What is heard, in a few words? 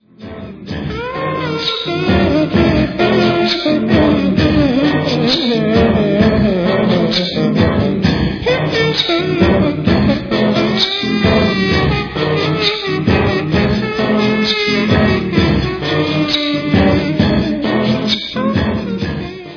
Sax